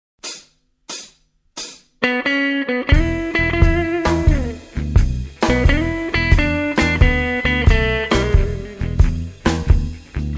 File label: blues jazz